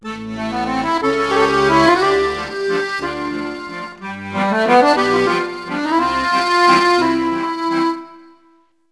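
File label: Accordion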